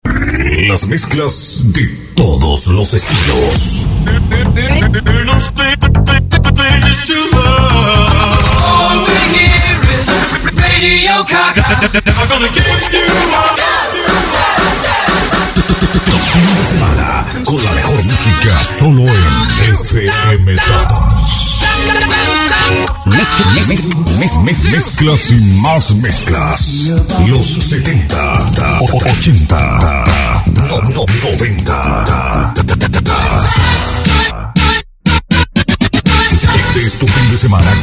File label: Jingle Fm Dance